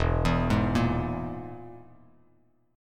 E Major Flat 5th E, Bass Standard; arpeggiated; JGuitar

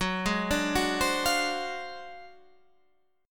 D 7th Sharp 9th Flat 5th Gb, chord

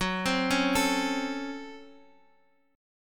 Db Major 7th Suspended 4th Sharp 5th Gb

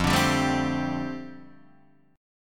F 6th Add 9th F down Guitar Open D, chord

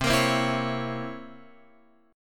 C 7th Flat 9th C Guitar Open F; C7b9 strummed; JGuitar

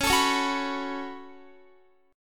Db 7th Suspended 4th Sharp 5th Db down Guitar; strummed

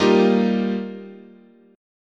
G Minor 7th Sharp 5th, chord

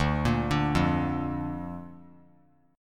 D Minor Sharp 5th D down; Dm#5 arpeggiated; JGuitar

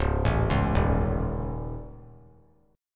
C sharp Suspended 2nd Sharp 5th C sharp; C#sus2#5; arpeggiated; JGuitar

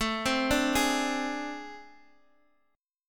A Minor Major 7th Double Flat 5th, down, Guitar; AmM7bb5; arpeggiated

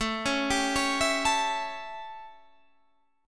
A 7th A, Guitar Drop D

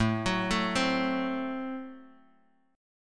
A Minor 6th A Guitar Open F; x,4,6,4,3,x; arpeggiated; Am6

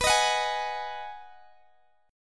B 6th B down Guitar Open D

